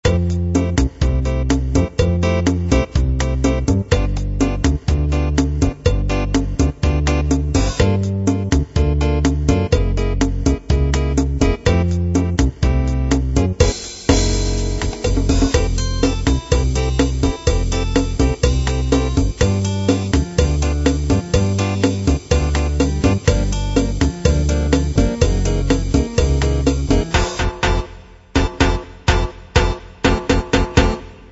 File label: bossa